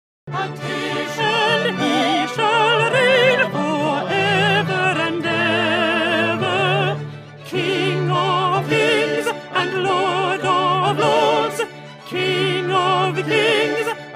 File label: Audition Practice Hallelujah Alto; ESYO Melodies